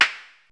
HI SNARE; BELLS+NOISES; AMG - Vince Clarke Lucky Bastard

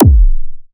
Phase Plant, kick

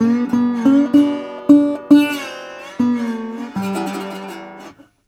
Blues Guitar Samples; ILIO - Fretworks

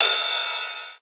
Ride Cymbal

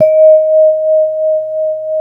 MLT VIBE

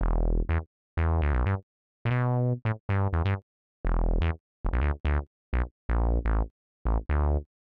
125 res synth bass E; PowerFX - Nu Jazz House

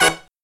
HIGH HIT, Brass Riffs; USB Soundscan vol.29 - Killer Brass Riffs; AKAI; HIGHHITS

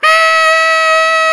SAX Tenor GROW; Tenor V-sw; Tenor 4way; Roland LCDP07 Super Sax

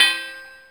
Perc, Korg Wavestation

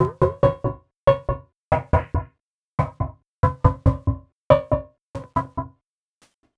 Envelope Beat; Drums & Drones

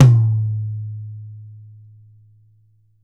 YAM9X13 CLOS, Drums; Big Fish Audio; Ross Garfield Drums VOL-2; TOPEKA KIT

